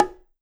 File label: Bongo High Open Soft; One Shots; Drums; Ableton Live 12 Lite; Core Library